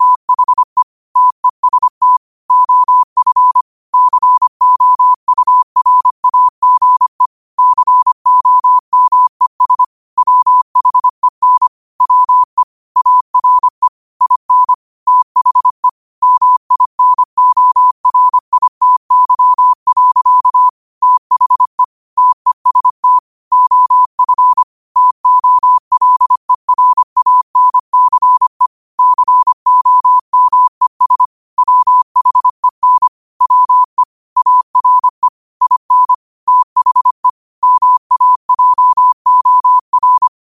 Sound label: 5 wpm, morse